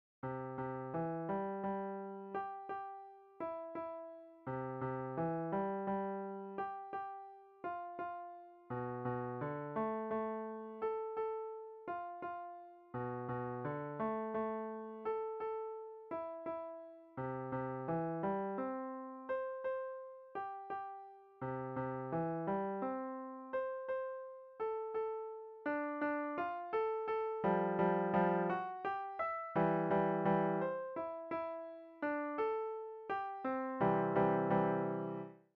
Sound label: piano; The Blue Danube; easy